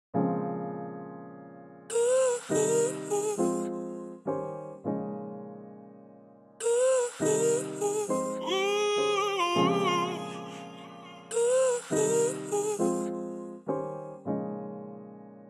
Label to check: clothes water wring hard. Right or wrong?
Wrong